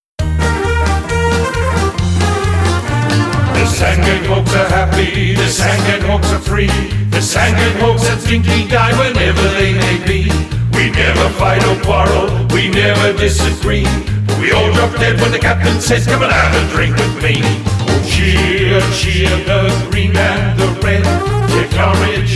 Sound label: Sandgate FC Song